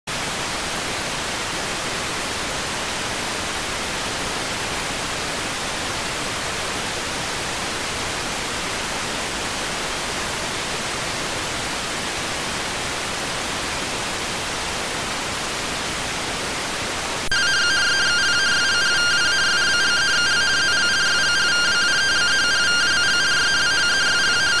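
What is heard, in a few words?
radioteletype receive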